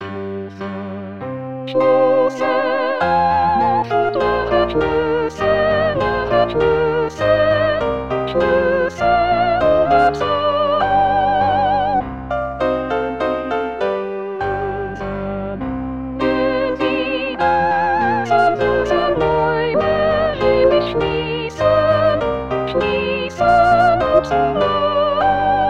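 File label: soprano; Jubilate; Brahms Waltz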